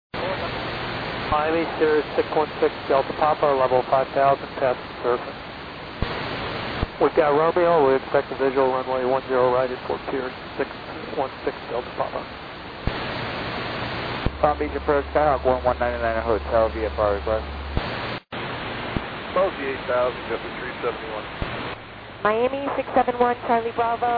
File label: airband